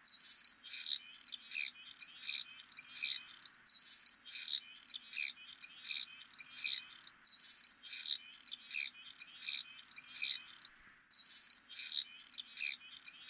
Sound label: Swift Duet Call